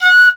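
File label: Piccolo Staccato F#5; Flutes; DirectWave; Varazdin Orchestral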